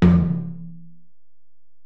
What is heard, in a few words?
Tom, Alesis DM-PRO